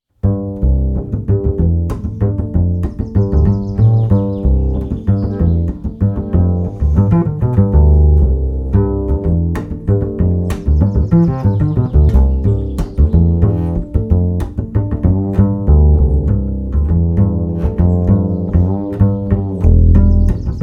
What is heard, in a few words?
solo